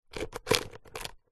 foley lock small diary movement could be unlocking; zapsplat